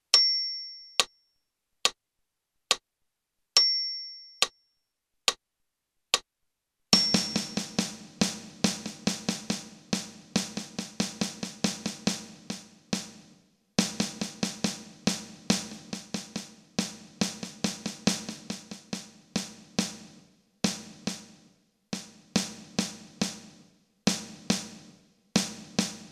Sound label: Percussion; backing tracks